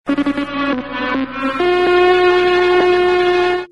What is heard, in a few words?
news intro